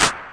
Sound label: Syn Dr, Overloaded Synthetic Drums; style kits